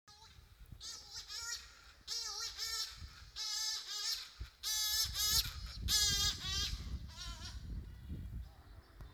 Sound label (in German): Aua Vogel